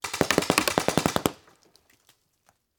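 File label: Paintball Gun Impact; Sound Ideas; Guns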